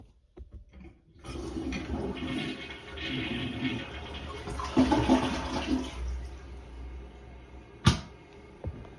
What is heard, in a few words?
toilet